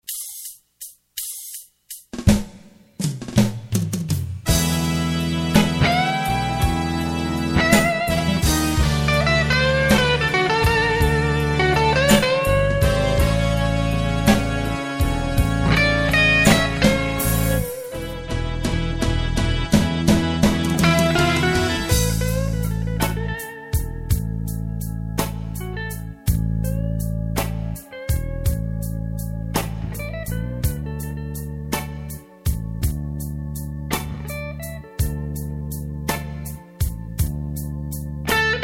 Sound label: blues